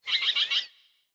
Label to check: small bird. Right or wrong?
Right